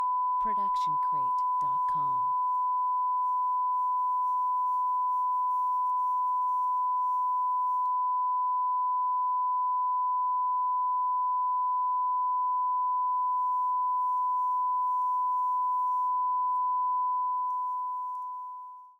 TV No Signal Tone; SoundsCrate

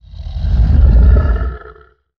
animal monster

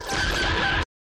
distortion hit; SoundsCrate

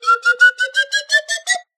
slide whistle upwards notes

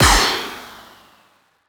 Gun Shot; SoundsCrate

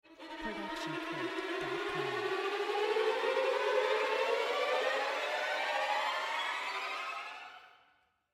trem

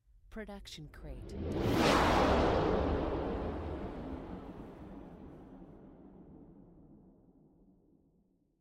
High Woosh; soundscrate

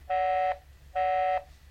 phone busy tone; soundscrate

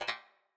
graphics robotic tick; soundscrate

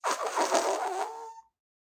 Fart Noise; SoundsCrate

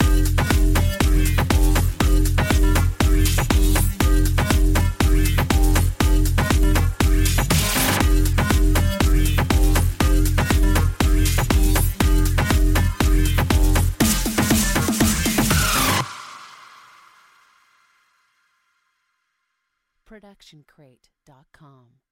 Logo Ident Robot Beat; SoundsCrate

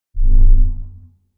large space ship short rumble; soundscrate

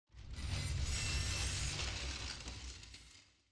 designer scifi mechanical movement; SoundsCrate

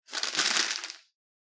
crumbled paper; soundscrate

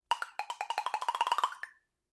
Slide Clicks Up; SoundsCrate

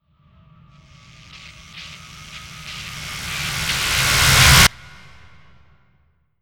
Sci Fi Teleport; SoundsCrate

